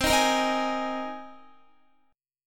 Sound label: C Minor 7th Sharp 5th, C, Guitar; strummed; JGuitar